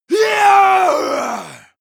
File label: male combat attack long